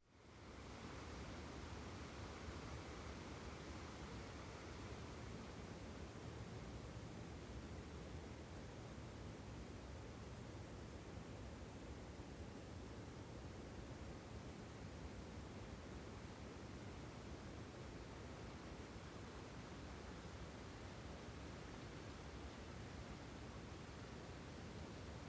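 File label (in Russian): ветерок среди деревьев; Звук легкого ветра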